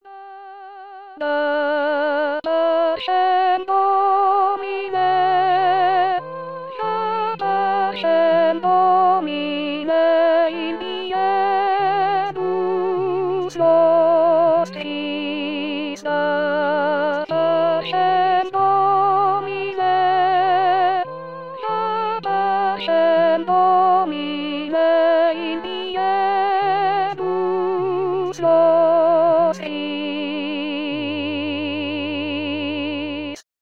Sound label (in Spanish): voz; dapacem; Coro "Con dos Bemoles"